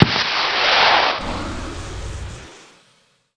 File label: MISSILE; Red Shark